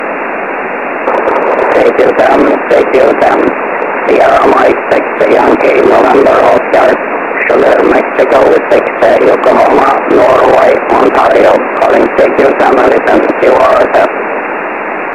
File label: 10m aurora; sm6yno